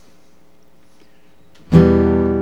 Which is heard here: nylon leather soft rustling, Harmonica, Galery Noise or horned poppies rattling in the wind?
Harmonica